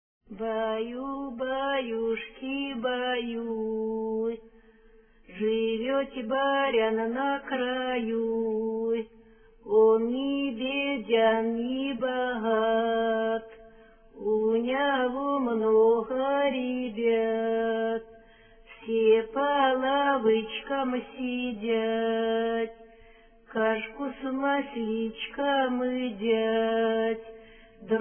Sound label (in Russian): новогодняя поздравительная, с.Ласино Касимовского р-на; Авсень, авсень